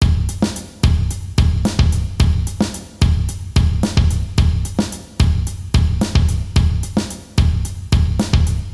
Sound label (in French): rythme, batteur